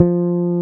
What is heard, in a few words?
BASSES, Roland